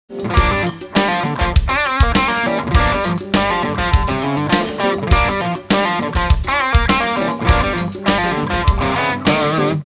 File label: Riff